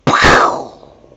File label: effect baam